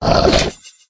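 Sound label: lizard block; yasher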